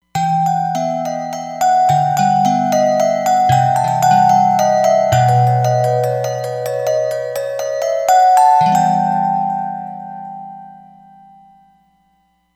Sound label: Bell 2, Roland Fantom XR